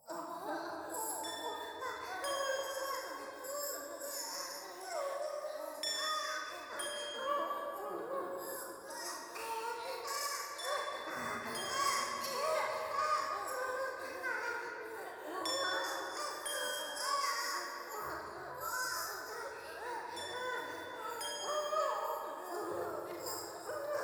低音喘ぎ 寺

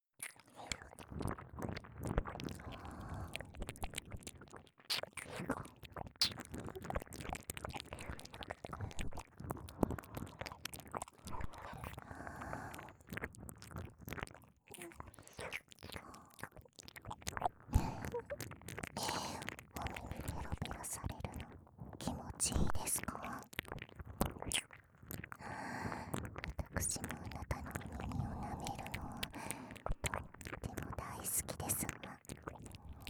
ひたすら耳舐め囁き; メスイキループ

